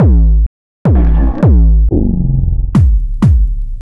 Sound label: vox low perc